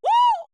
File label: perc voice, Roland MC-909